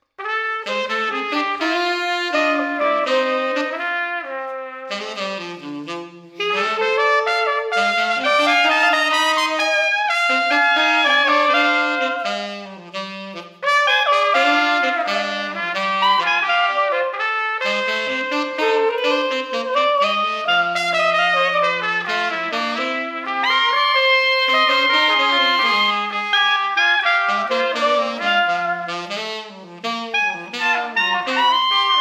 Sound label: Street Brass Tipsy Gypsy 85